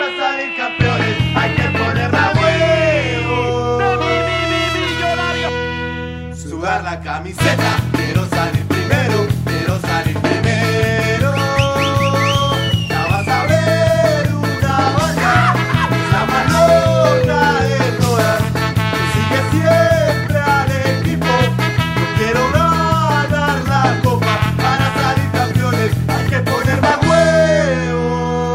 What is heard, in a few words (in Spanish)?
salsa; millos